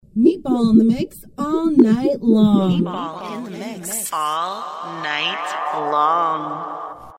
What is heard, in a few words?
electronica sweeper